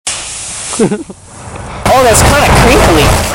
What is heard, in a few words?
crinkly